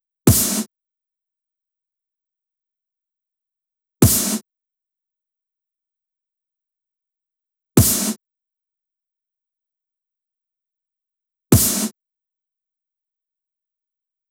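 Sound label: snare Hits, Drum Loop 128BPM; VEC5 Drumloops; VEC5 Multi Loops; Loop Elements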